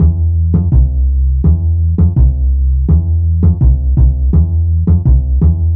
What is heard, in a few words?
Fat Upright, Bass Lines 166.5; Zero-G Jungle Warfare 1, 2 & 3; 90 s Sample CDs